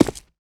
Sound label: concrete, footsteps; player